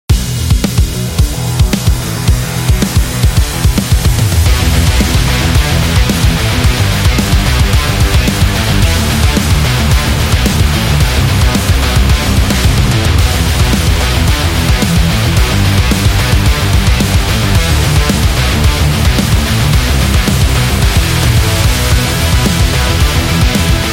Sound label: Action Sport Rock Metal